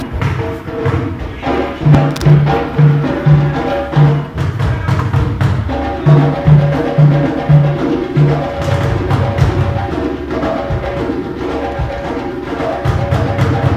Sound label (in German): Trommeln